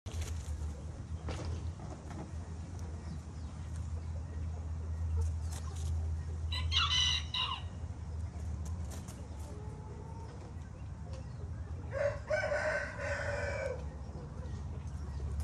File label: Cubalaya; کوبالایا